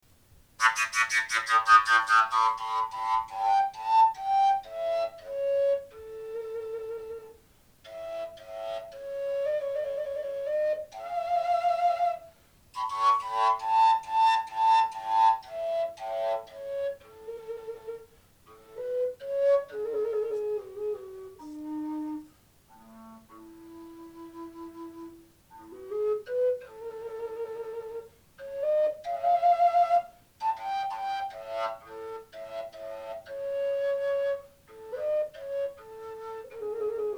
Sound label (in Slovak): fujara A